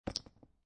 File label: Poker Chips Multiple Dropping On Felt; Poker chip drop on felt: Multiple poker chips being dropped on felted table; Lynne Music